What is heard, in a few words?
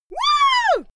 female cheer woo; schreie; menschen